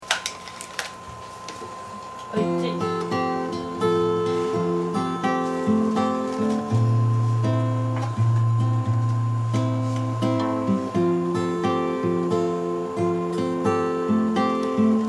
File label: guitar guitar; tonica